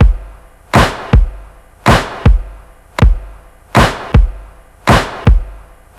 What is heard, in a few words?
Verb Soft; Downbeat